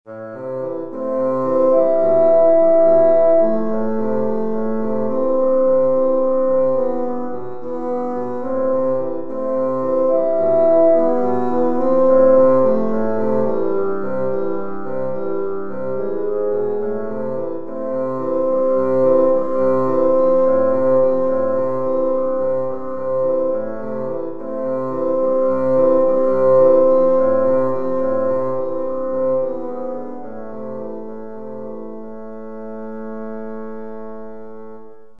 woodwind

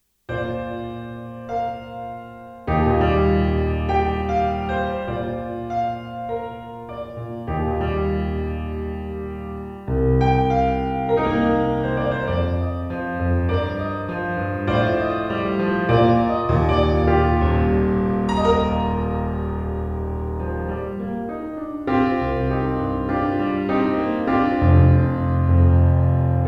piano pop

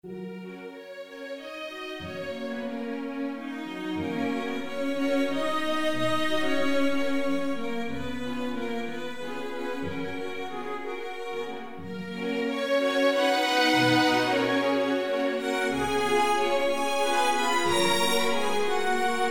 string orchester

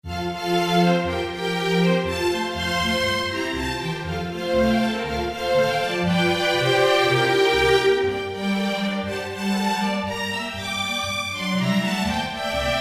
string orchester

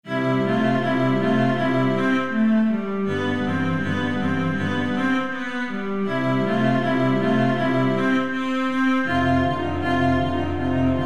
strings hoerbeispiel

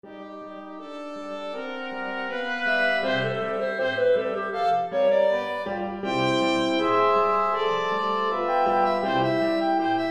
ragtime

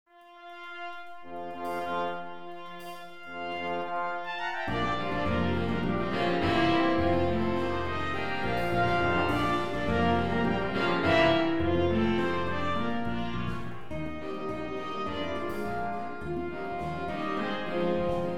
jazz ensemble

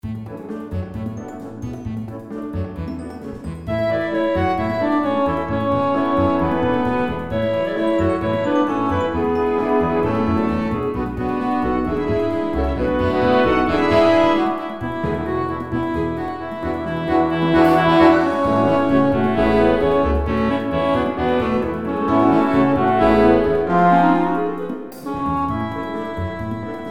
concert band

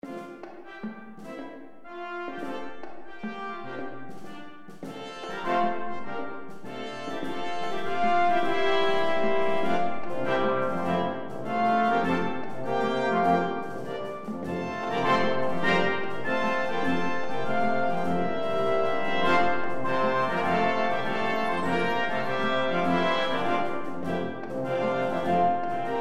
brassband

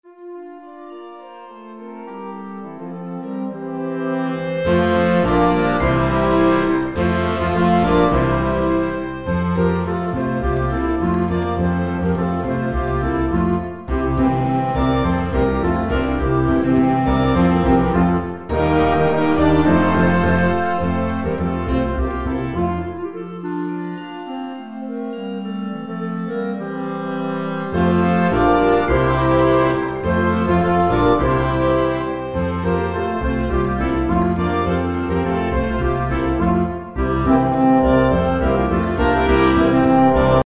concert band

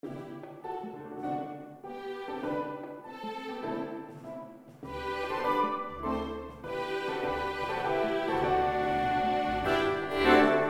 full orch

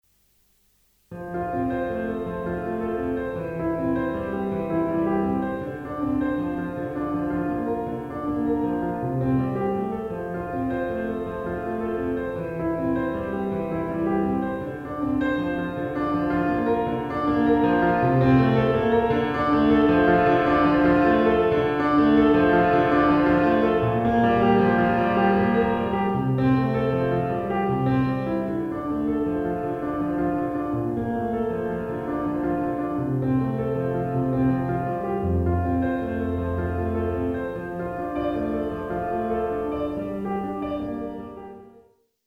piano pop

